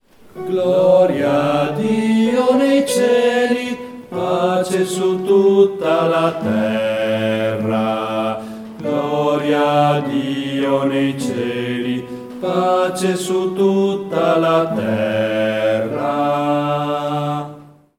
BASSI

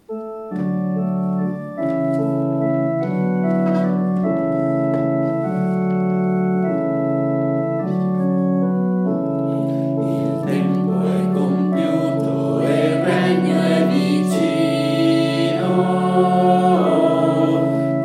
il Tempo E compiuto con Canone

